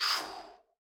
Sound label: Human Swoosh Long; Human Whooshes